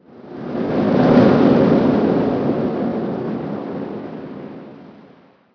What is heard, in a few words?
Ambient